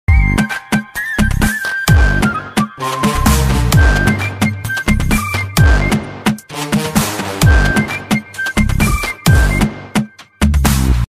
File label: new bgm ringtone song ringtone bgm tamil ringtones; ayalaan